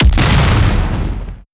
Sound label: explo base, strider, combat, seq death; creatures